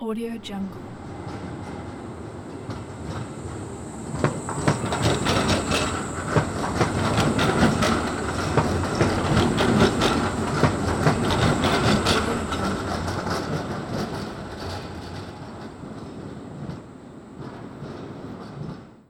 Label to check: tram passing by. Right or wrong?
Right